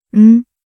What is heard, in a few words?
tap; linxia